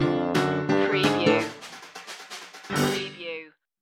stinger

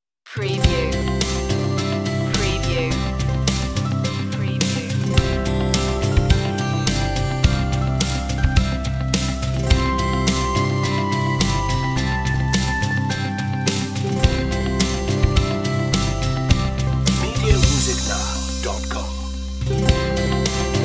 itsy bitsy spider underscore version no sound-fx

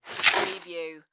Bucket Dump Dirt; sound-effects-bible

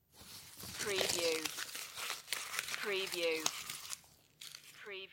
crumble paper

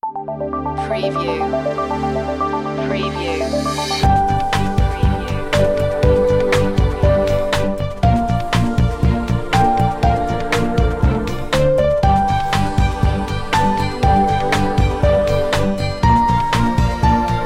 short ident

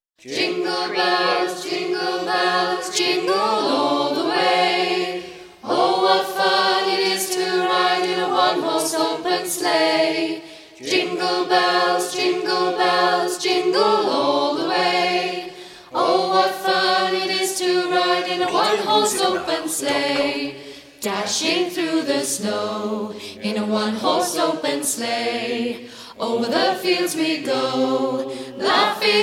jingle bells choir

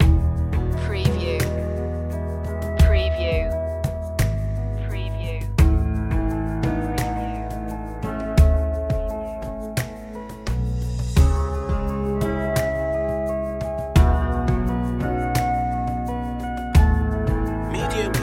beauty and calm

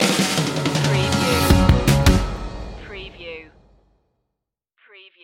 funky hop stinger; rhythm and blues

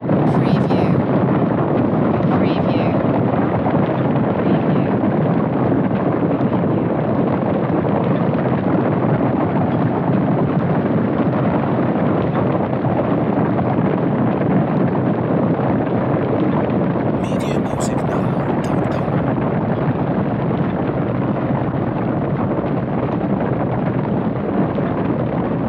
Cattle Stampede

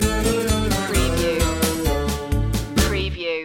polka stinger

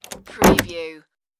room door close

